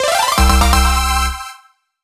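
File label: Lvup, level up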